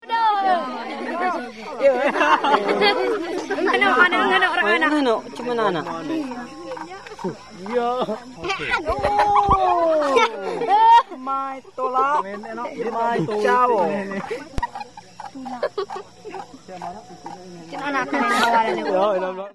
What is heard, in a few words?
jungle video laugh; malaisie